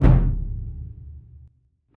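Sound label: Music Rituel Migration Perc 120; from dust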